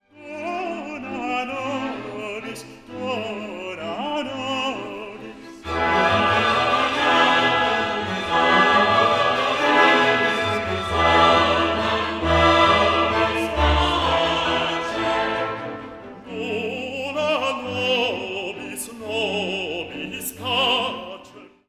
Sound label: Dona nobis pacem tenor bass